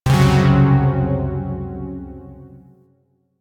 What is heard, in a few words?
Shawzin Hirajoshi Chord; Music; Warframe; Zariman Shawzin